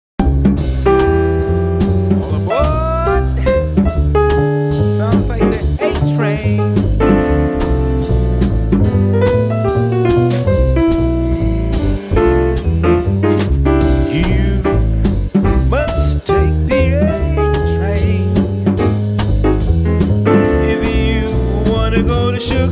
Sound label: swing